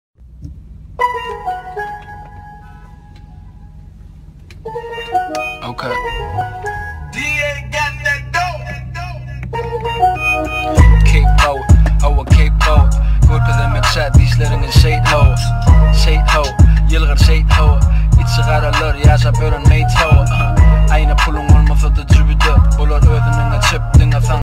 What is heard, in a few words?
instrumental; hiphop